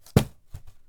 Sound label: Throwing; Phasmophobia